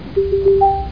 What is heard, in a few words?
Minimize; Sci-Fi Tribute